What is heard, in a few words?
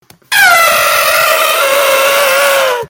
human horn